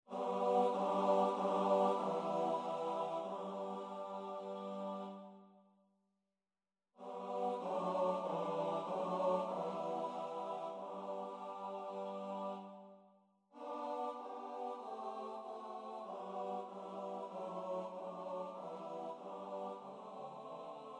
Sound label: TTBB